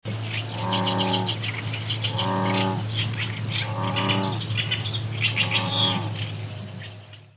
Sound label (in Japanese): usigaeru